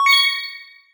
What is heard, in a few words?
Ingame Score Top; disney star smash android ios mobile gamerip 2020